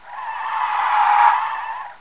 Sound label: SKID MED; Andretti